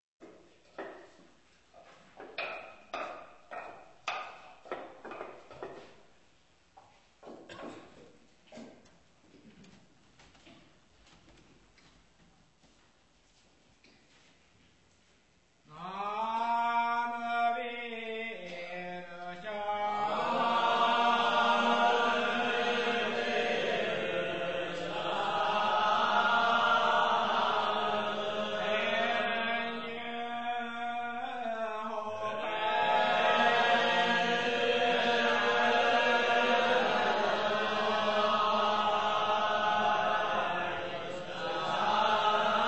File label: shomyo harmony of japanese